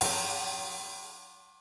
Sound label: half hat long